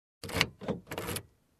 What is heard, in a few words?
Unlocking Car with key